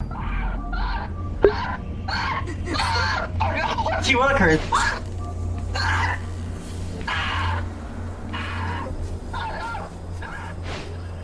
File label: screaming